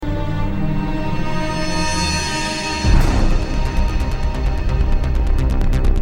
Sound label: suspense